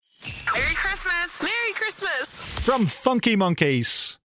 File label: Xmas Merry Xmas Stab; FM Radio; Xmas Jingles